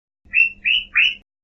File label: bip